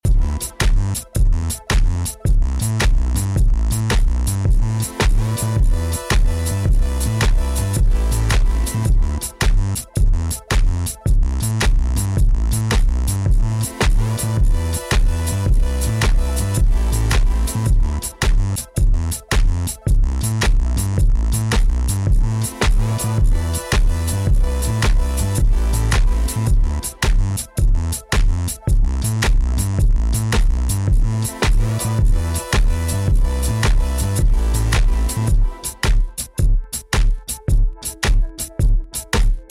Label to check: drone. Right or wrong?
Right